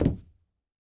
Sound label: Coconut Impact